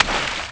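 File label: splash; The Elder Scrolls III Morrowind GOTY; XBOX; FOOT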